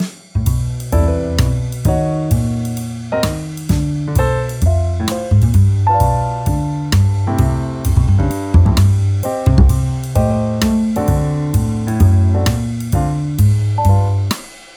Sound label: Bass; AI Jazz Bass Demo 3